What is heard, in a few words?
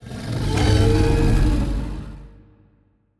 kaiju adult rage roar; biogenesis